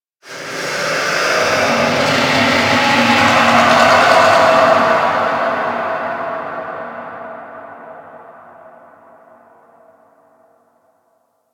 Ambience Hub One Off; Warframe; Tenno

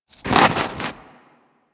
Armadillo Move Snow, Foley; Warframe; Hunting Critters

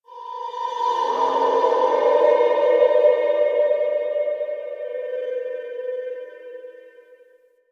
Mid Texture; Warframe; Quests; TheNewWar; Ambience; Zariman

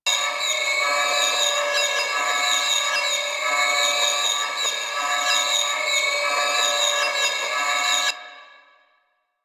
Sentient Ramseld Engine Loop; Warframe; Vehicles; RailJack; RailJackEnemyShips; Sentient Ramsled Agent

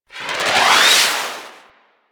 Swarm Missile Projectile; Enemies; Warframe; Eidolon; Jetpack Marine

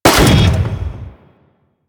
Saw Blade Launcher Shot; Warframe

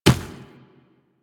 Infested Medium Run Dirt, footsteps; Warframe

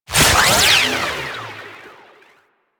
Marker, UI; DE; Warframe; Parazon

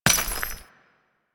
Step Dirt, footsteps; Warframe; Grineer Royal Jester; Foley

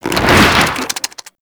Infested Devourer Movement Whoosh D; Warframe

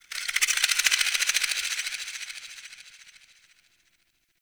Snake Rattle; Ambience; One Offs; Red Night

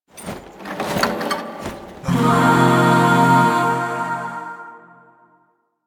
Void Shawzin Equip; Warframe; Zariman

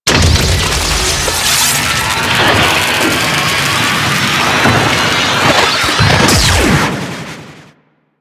Crp Ship Void Portal Charge; Ambience; Props; Warframe; Corpus Ship Remaster

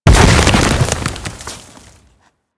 Ice Ring Spike Spawn; Enemies; Abilities; Warframe; Corpus; Arachnoid Camper Terra

